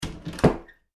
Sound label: household door wooden internal shut; Door Shutting - Wooden Internal Door Closed; Miscellaneous Doors; McKinneySound